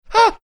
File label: aah